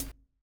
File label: KIT, Dance Mega Drums, HIP; Best Service